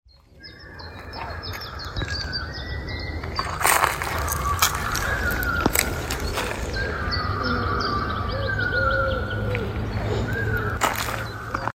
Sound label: Weird noise